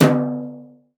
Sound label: brush; Northstar - New Gold VOL-2; 50S BRUSH